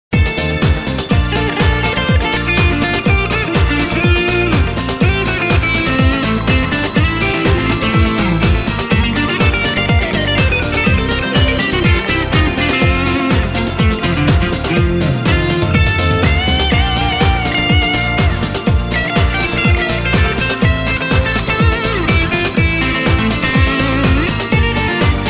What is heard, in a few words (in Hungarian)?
gitar szolo